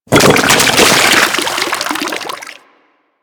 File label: Shipyards Sludge Burst; Warframe; Grineer Shipyards